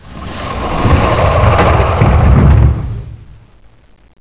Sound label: door move